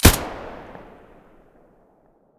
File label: aps muzzle stereo; STALKER; IWP